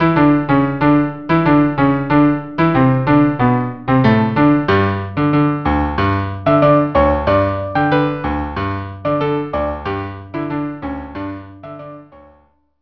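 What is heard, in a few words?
Tango viola cello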